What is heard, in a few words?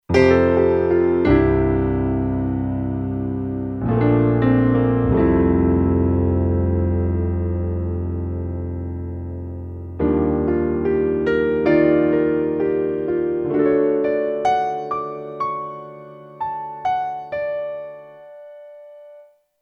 Piano for Layers, Kurzweil K2500X; Factory Programs